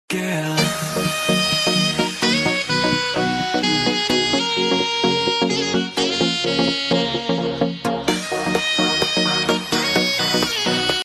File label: saxophone ringtone